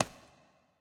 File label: footstep; smite; pele